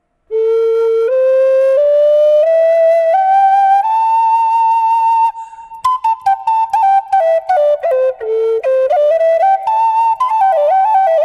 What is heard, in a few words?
a minor, western red cedar flutes; standard flutes